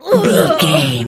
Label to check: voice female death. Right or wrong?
Right